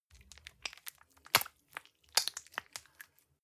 gel dispenser press squish, badezimmer; haus garten

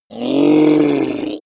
reptile dying choke

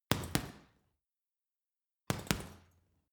boxing punching bag hit double; sport-freizeit; boxen

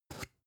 spice jar big scratch open

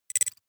noise flick splutter; soundpool

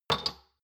shot glasses stack soft short; soundpool

